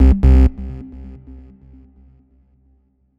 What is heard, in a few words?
Sound Slot No More Coins